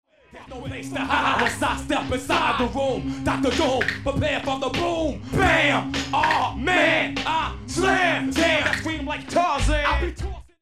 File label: Snare (fake)